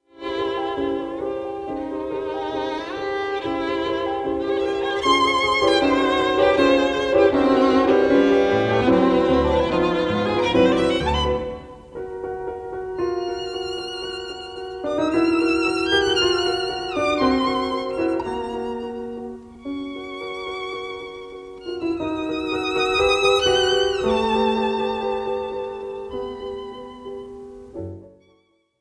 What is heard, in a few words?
sonata in G minor for violin and piano; claude debussy; arthur grumiaux; istvn hajdu